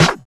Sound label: Claps & Snares